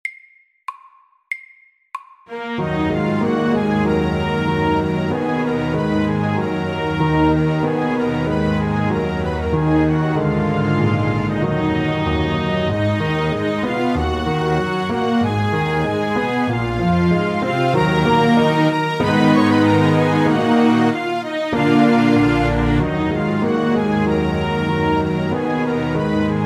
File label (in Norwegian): Gammel fransk melodi